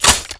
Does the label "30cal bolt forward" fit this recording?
Yes